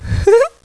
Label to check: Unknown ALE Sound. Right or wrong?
Wrong